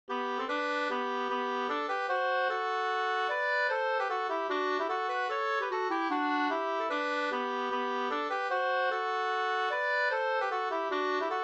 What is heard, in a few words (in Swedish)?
reinlender; fr Eidskogen o Eda Vr